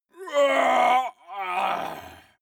male groan painful double

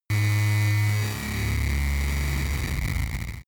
engine small overload electric; energie

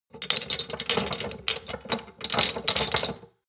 shot glasses clatter; berufe; gastronomie; soundpool

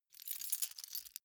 key ring large rattle soft; objekte; schluessel

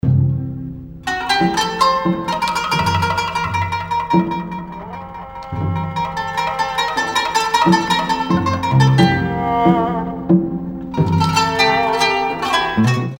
oriental composer; music elements; grooves

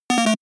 feedback negative 8bit

